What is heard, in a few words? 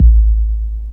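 bass drum hip hop; DRMKIT; E-Lab; X Static Goldmine 2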